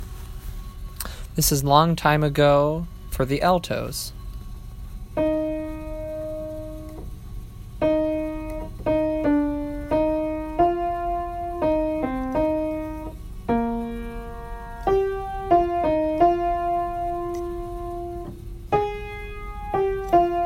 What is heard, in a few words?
Alto